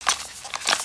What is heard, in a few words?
idle rattle